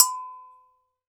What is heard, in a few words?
GANKOKWE, Drums; Drums&Percussion; Gankokwe Bells; E-MU Producer Series Vol. 7 – Old World Instruments